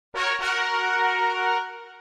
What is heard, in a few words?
duolingo complete lesson sound effect